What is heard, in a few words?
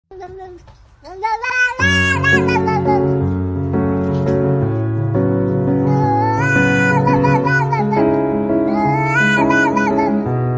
wha wha wha cat song